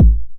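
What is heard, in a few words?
909 BD tape, kick drum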